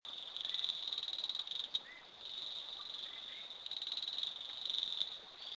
eleutherodactylus unicolor el yunque national forest puerto rico; Its peculiar and unmusical call is a short trill composed of six or seven fast notes.; A chorus of them sounds like a group of insects.; West Indian